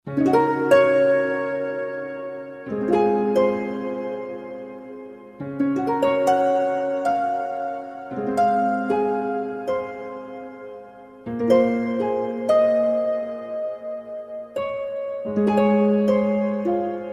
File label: Tavern; The Chronicles of Spellborn